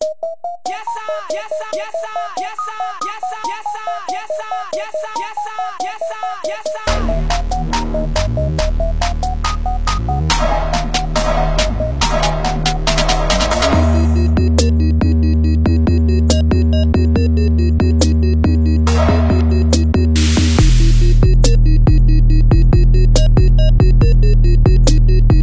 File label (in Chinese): 鼓组; Battery4; Komplete